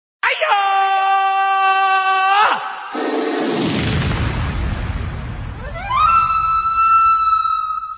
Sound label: scream Pound Whistle Yell; MISC and SPOKEN WORD; VOCALS and SPOKEN WORD